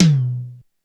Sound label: Med Tom, Korg DSS-1